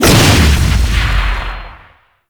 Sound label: Fists Heavy Punch Cast; Warframe; Duviri; Dax; Player Abilities